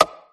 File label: baseball hit ball